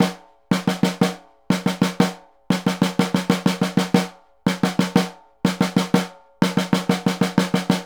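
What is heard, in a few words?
snare, Synclavier Percussion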